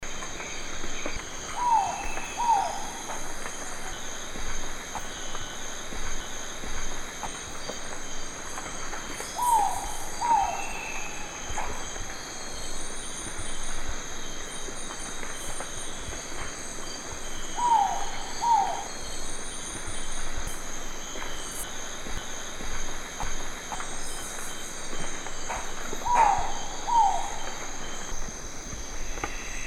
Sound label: Ninox theomacha; uilen; Strigidae; Bruine valkuil